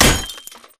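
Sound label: Impact Pulse Blade Metal; Titanfall 2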